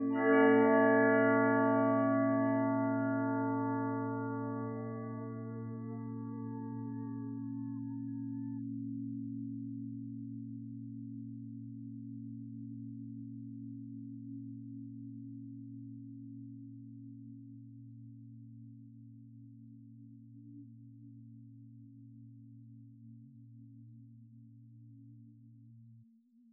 bell resonances; Lincoln; extracted with SPEAR

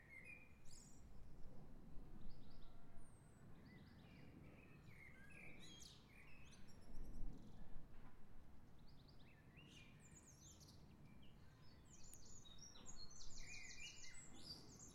beautiful birdsong from my garden in spring

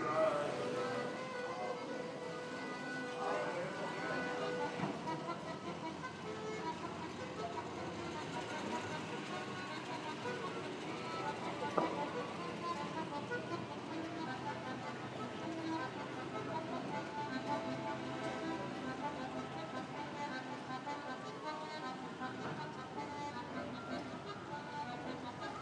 accordion player, venice

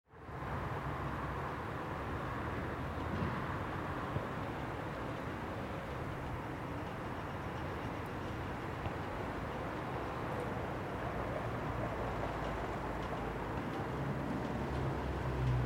soundscape of a formerly busy avenue in porto

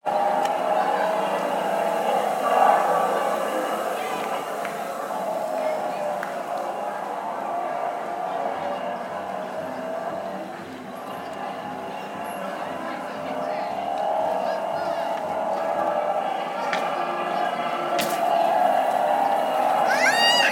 motorbikes

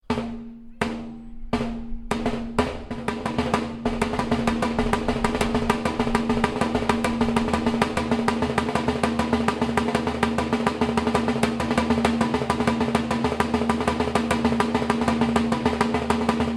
lambeg drum; brownlow house

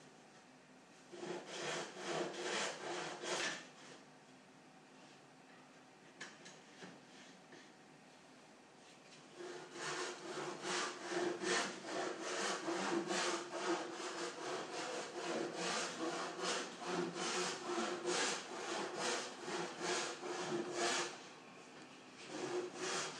a quiet morning in the prototyping lab

